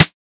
sharp snare one shot e key

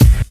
00s hip hop steel kick drum sample a sharp key

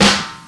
present snare one shot g key; snare drum samples

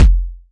perfect kick drum f key, Loudest frequency: 212Hz; kick drum samples

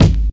present kick single hit g sharp key

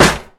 pop acoustic snare d sharp key; snare drum samples; one shots

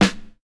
sharp snare sample f key; snare drum samples

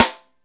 loud acoustic snare sound a sharp key

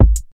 modern bumpin tr 909 analog kick drum g sharp key

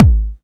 loud bass drum g sharp key

